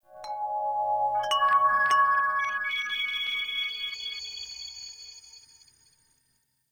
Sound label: Chimes; Warframe; The New War; Narmer Outpost